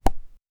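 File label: a short realistic sound of, golf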